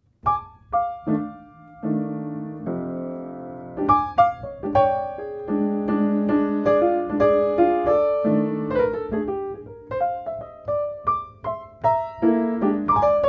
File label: Blues Improv on piano